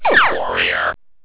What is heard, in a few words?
phasers; VitalWatch; warrior